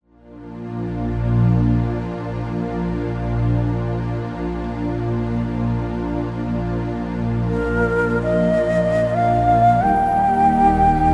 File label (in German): Märchenhafte Entspannungsmusik